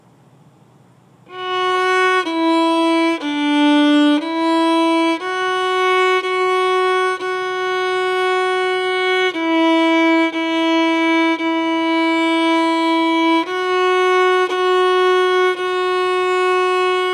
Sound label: Mary Had a Little Lamb